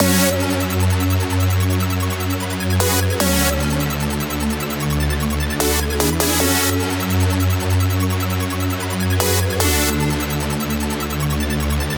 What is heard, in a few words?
Raptor Chord Loop 150 BPM F# min, Dubstep; Chord Loops; Synths; Cymatics; Dubstep Starter Pack